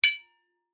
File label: impact metal light resonate